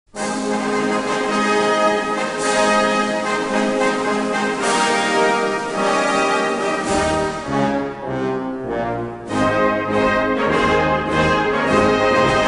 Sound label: instrumental Dutch Cavalry Song; Het Alexanderlied